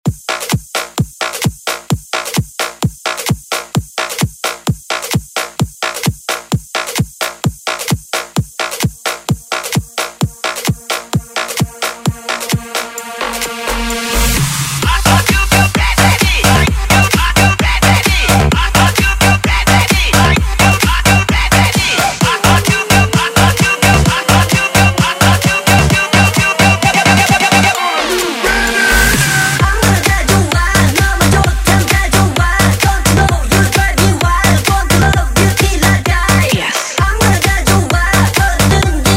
Bounce Clean

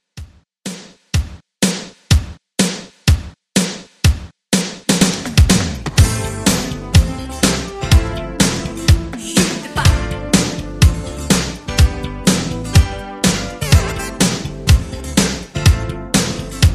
80s Redrum, 124 Bpm

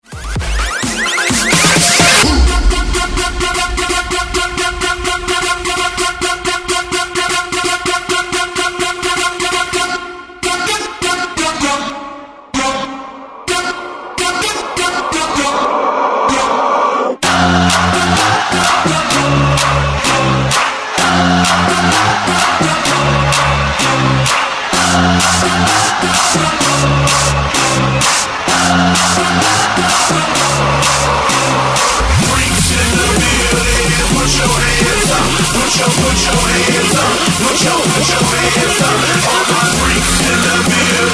130Bpm BREAKMIX; DJDOLLS

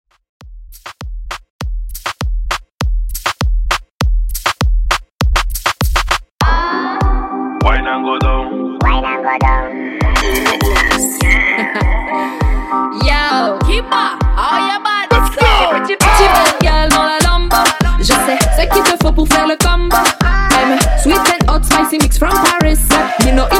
Moombaton 100Bpm

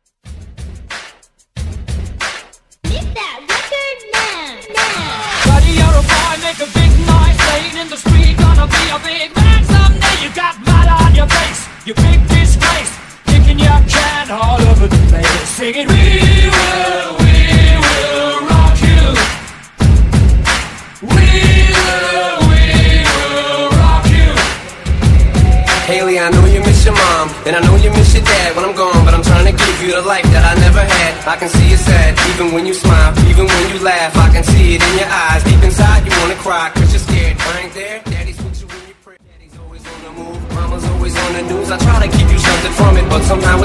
Festival Transition Edit

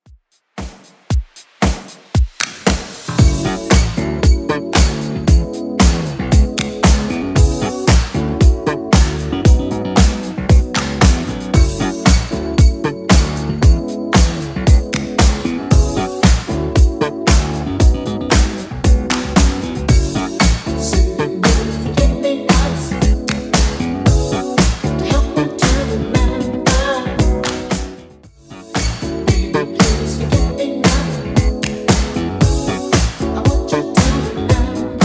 80s Redrum 115bpm

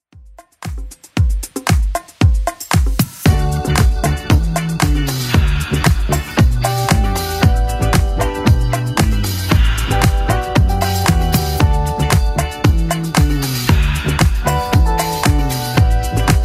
70s Club Edit 115bpm

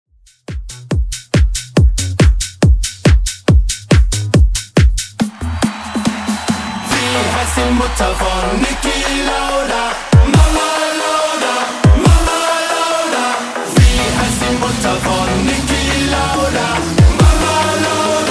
Redrum

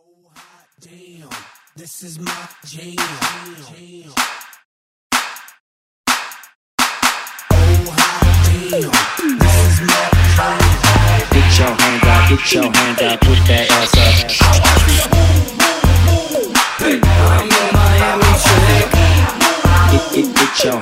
throwback clap apella intro

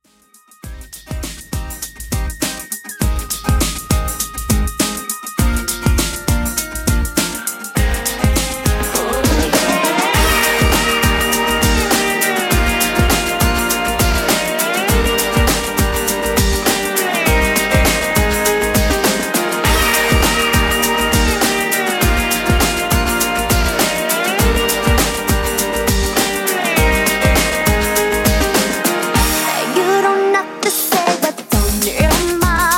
Pop Classic Redrum 101Bpm

